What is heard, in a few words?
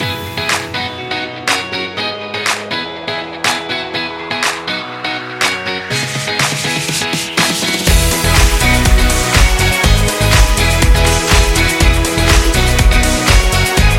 Indie Pop Guitar